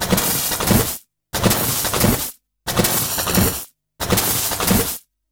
090bpm, Downbeat Dance Grooves

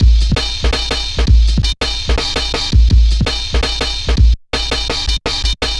Klanga, drum loops 165.5; Zero-G Jungle Warfare 1, 2 & 3; 90 s Sample CDs

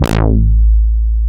A-BASS, AN BASS

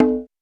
Roland U220 Electric Set, Drum Machines

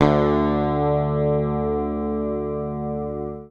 PICK, Bass Library; Sampleheads; Will Lee Bass Library

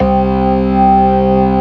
STOPS, ORGAN; Keith Emerson Signature Series

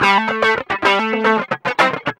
GUI, licks; 110 LICKS

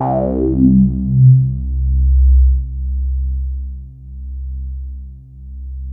FUNK; CMP - Analogon

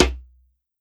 MIXED DRUMS

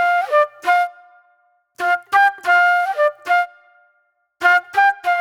J-Funk Flute, Call And Response

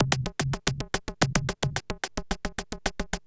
Beats, Industrial

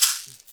CAXIXI; InVision Interactive; Percussion Slam; ZOMBIE

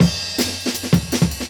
160, Jungle Breaks; Original Jungle Breaks

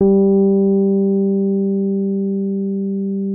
RHODES MK1M G3; Xsample VOL-1

